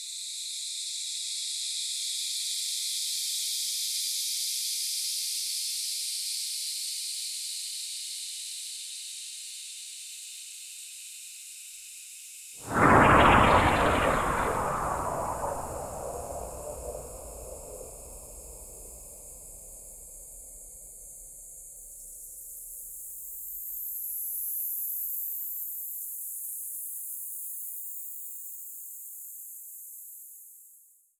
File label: crunch strata